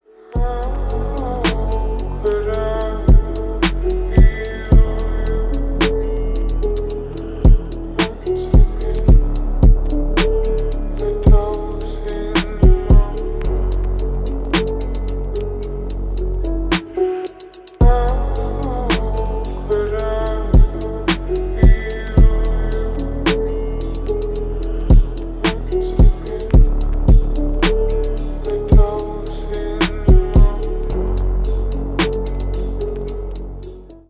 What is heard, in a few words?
remix